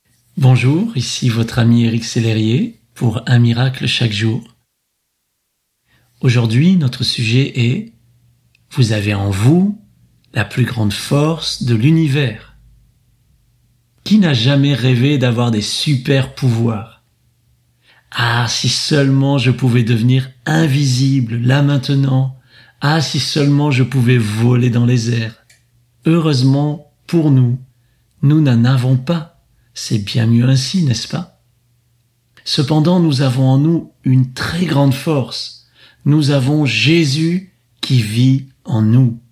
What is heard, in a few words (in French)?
voix seule